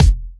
Soft Kicks; Vengeance Samples

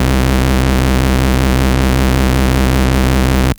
Ultimate Bass Root C; Vengeance Samples

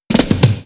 Fill 128 BPM; Loops; Vengeance Samples

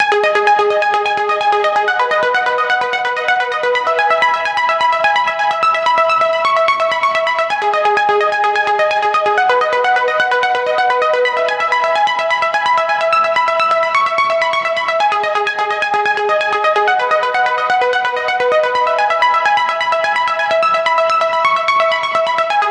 Vocoder Arp, 128 BPM; Vengeance Samples; VTDS2 Song Kits; Vocoder Mother Earth

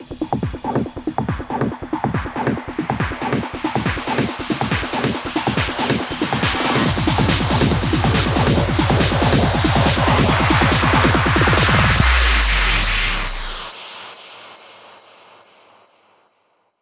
140BPM 8Bars, HandsUp Trance Fills; Midnite; Vengeance Samples

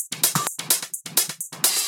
House loops 128BPM; Vengeance Samples

